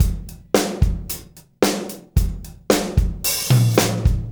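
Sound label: 111 bpm, beat loops; Zero-G; Monster Beats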